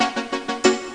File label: Witch; AMUG Show